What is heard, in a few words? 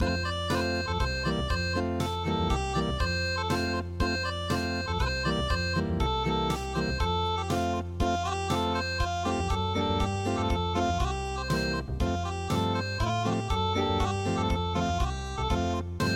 unpluged